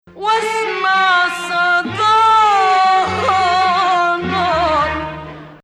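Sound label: Ajam Hijaz, Arabic music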